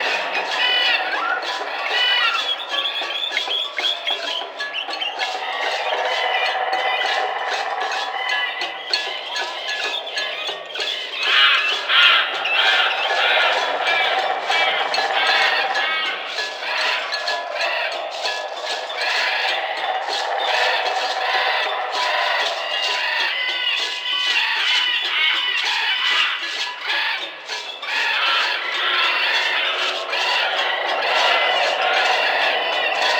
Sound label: fire Pit Source Music And Effects